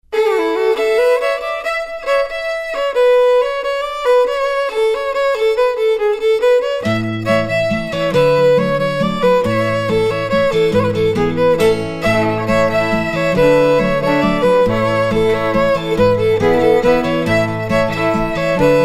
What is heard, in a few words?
Cafe Waltz